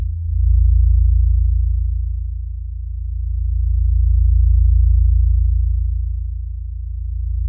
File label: tower hum